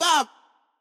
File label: Vocal Glitch